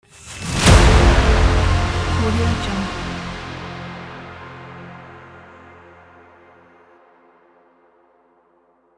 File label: trailer hit; musicbaker